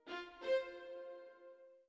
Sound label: orbit violin; Sky Children of the Light gamerip